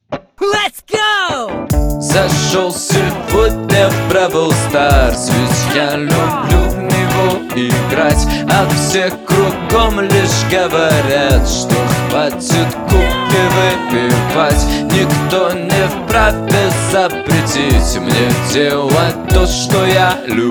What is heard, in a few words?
boom boom bass